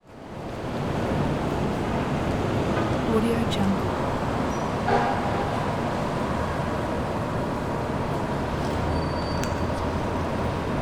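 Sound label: ambience city street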